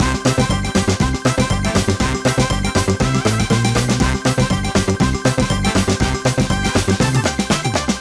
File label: big Win; flowers